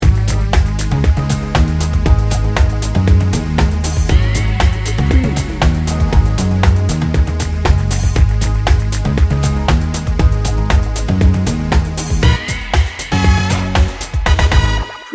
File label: beat bounce